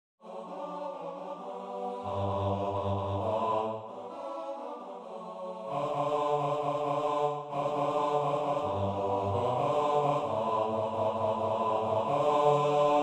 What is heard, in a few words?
bass